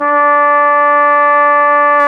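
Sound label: BRS, Brass Trumpet f; Roland L-CD702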